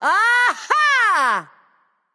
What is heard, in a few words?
nv yay; smite; awilix